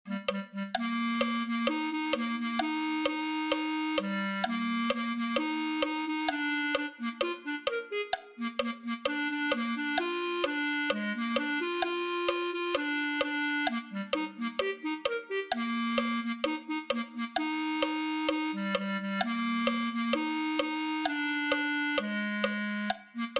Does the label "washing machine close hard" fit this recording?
No